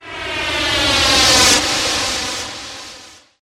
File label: sound of jet plane; ringtones; sms